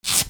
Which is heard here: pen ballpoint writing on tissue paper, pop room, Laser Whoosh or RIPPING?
RIPPING